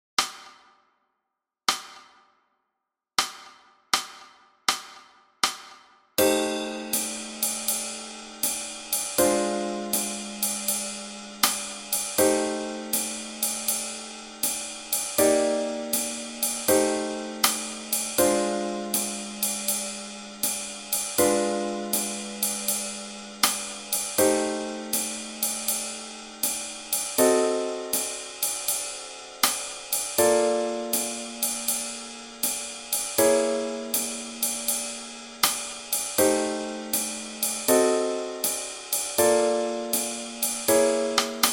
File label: Jazz Blues F 80